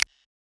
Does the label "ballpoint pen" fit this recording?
No